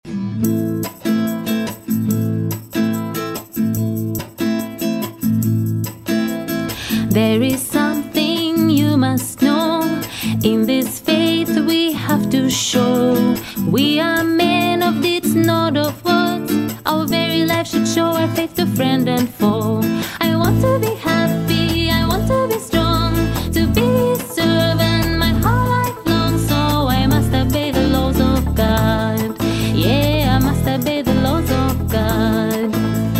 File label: Song, EN